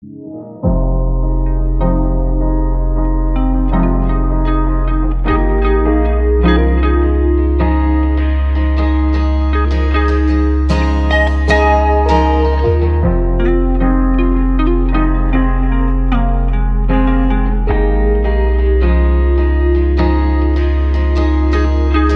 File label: BEAT INSTRUMENTAL RINGTONE